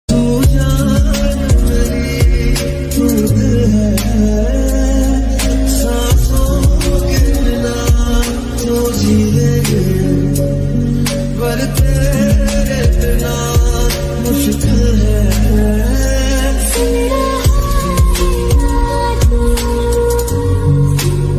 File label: hindi ringtone sad